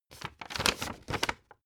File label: old flip page fast